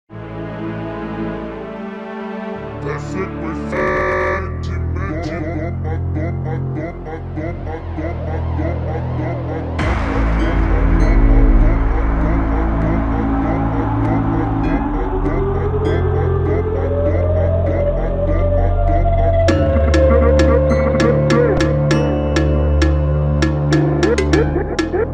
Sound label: funk super slowed